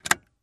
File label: Dodge Van Switch Push; switches